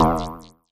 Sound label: synth boing